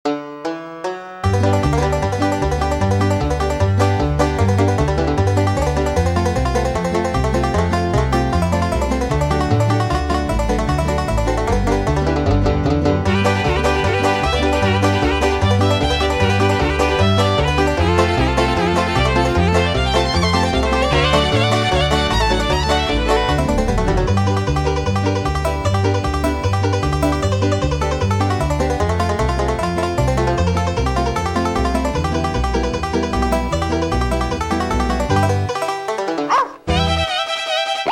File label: banjo; EWJ